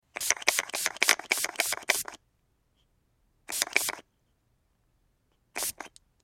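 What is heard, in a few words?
hairspray sounds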